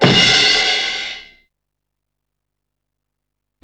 TOY CRASH, DUB FX; Drumdrops In Dub VOL-1; SINGLE HITS

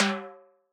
Timbale H, Yamaha DJX 2B

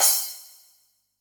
SPLASH, Yamaha DD-65 CYMB

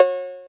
analog cowbell, Yamaha TG-500

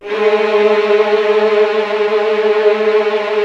HF TRLL G#3, Violin; Q Up Arts; Denny Jaeger Master Violin Library; PERFORMANCE

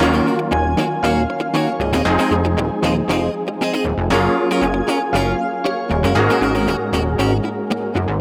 Backing, 117bpm Bm; Zero-G; Nu Disco; Construction Kits; That Punch

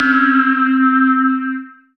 SYN Dig Syn!; Best Service Gigapack 1

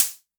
More hats, Hi-Hats - High; LIMAT Div Dept; Drums

